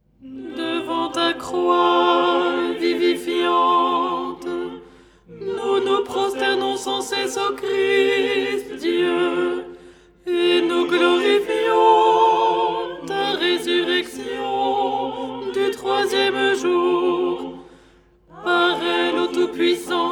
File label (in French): alto; Au lucernaire, 1ère stichère du dimanche; Les 8 tons en français; ton 4